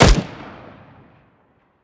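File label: m4a1 unsilenced, weapons; csgo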